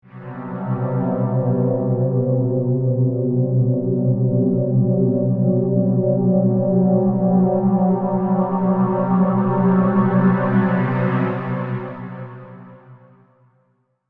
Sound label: Synth, Stab, Mysterious; Stabs; BlastWave